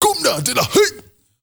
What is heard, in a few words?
MALE RAV, MALE NOISE, Heart of Africa